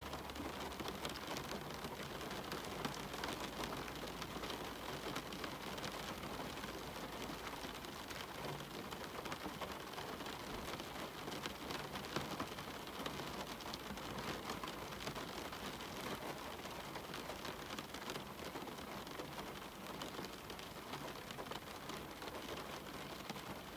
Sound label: gentle rain on window